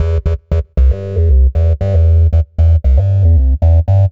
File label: Synth Bass